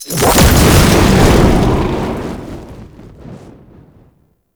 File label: Dash Start; Roathe; Warframe; Tau; Abilities